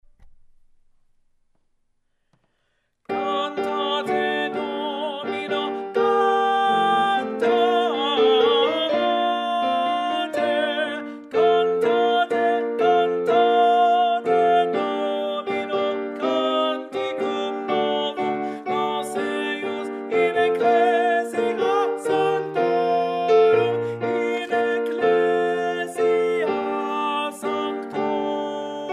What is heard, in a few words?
Cantate Domino Alto; Cantate Domino, G. Pitoni